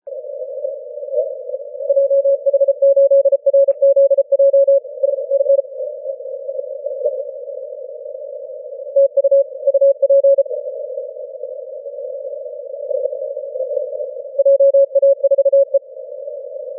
30m cw; 1u4un

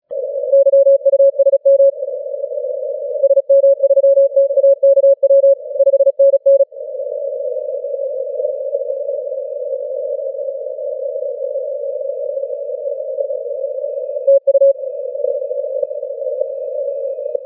15m cw

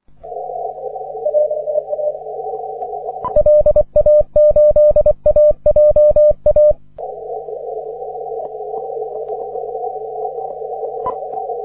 cw; 20m

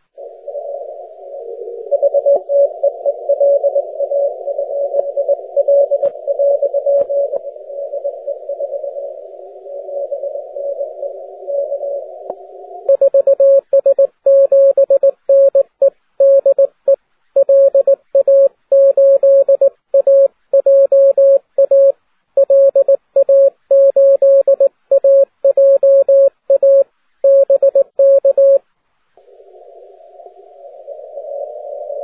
10m cw

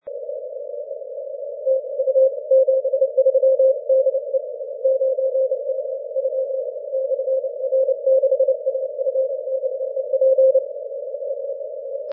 17m cw

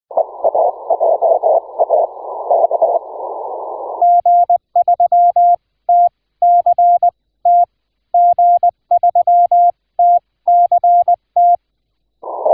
aurora

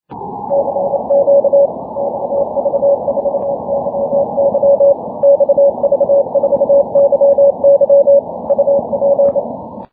cw

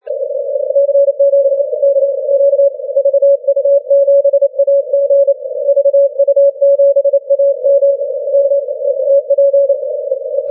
80m cw

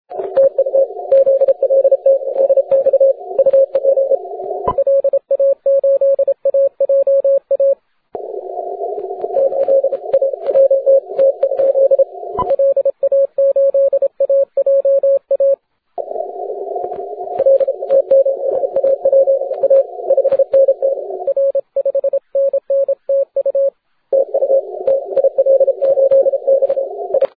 30m cw

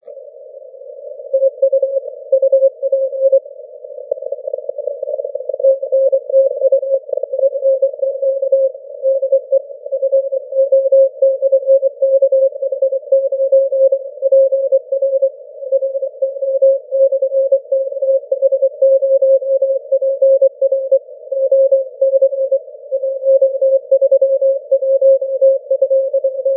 30m cw

